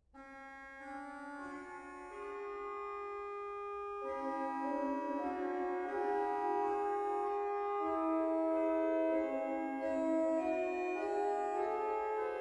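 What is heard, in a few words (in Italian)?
canone modale, organist; Canone modale (2024)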